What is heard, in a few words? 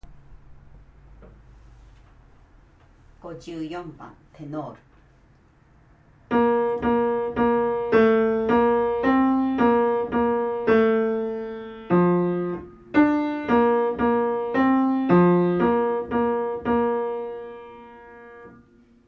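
tenor; キリスト集会合唱団・合奏団; 賛美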